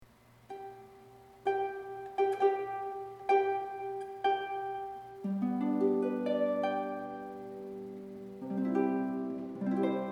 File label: solo harp